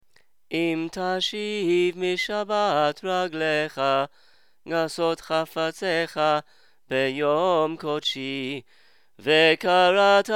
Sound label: kiddush morning